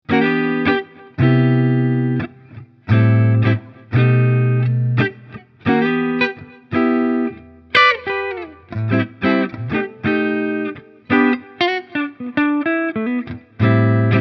Custom 24 Hals HB clean; PRS SE; Stealth Quilt LTD

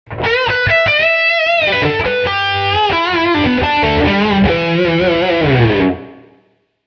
Lick Bridge Humbucker

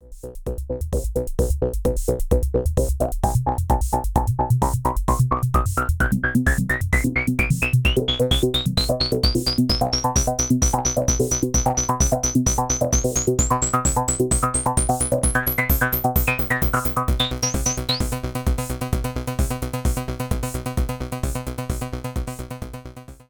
System 500 521 Resonance

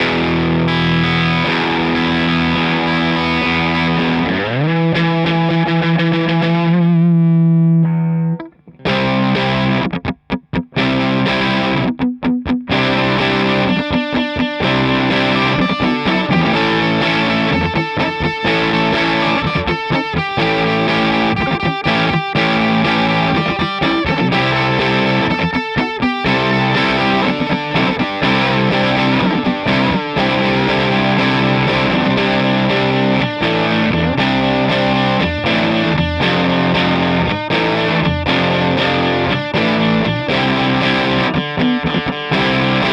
Maybach Lester Junior Double Cut Bridge Overdrive

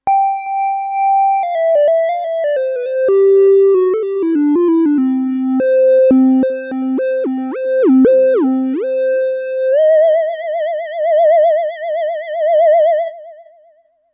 lucky lead

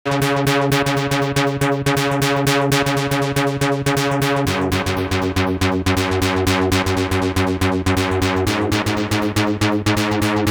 JunoDS88 Pluck Synth ARPs

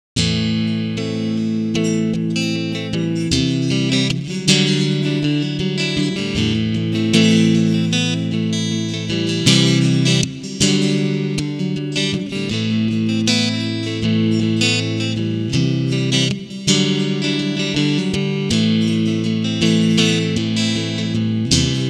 Single Coil Acoustic Sim

